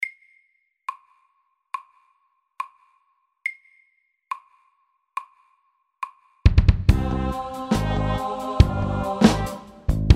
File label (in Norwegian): Octave rap MIDDELS